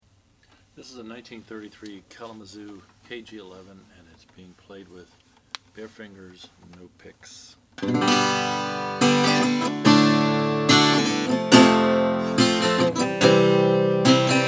kalamazoo kg11 sunburst; 1933; gibson

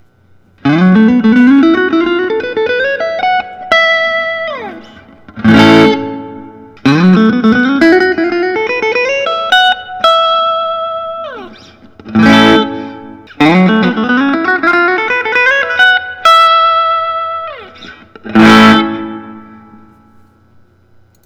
gustavsson fullerblaster s teaburst

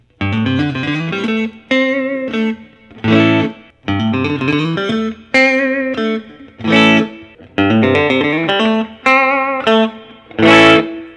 Fender Custom Shop; greg fessler

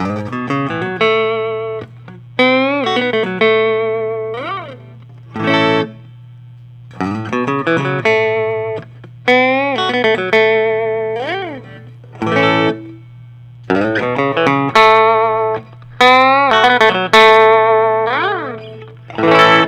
hollow gold top; michael tuttle

